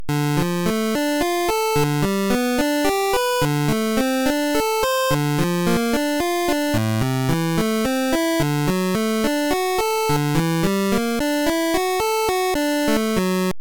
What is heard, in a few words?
arpeggio 25 pulse